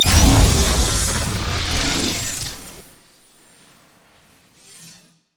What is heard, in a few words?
upgrade; Cyber Ezreal